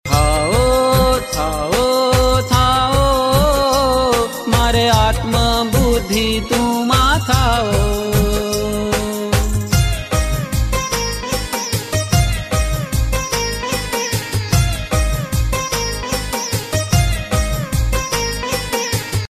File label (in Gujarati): Thao thao thao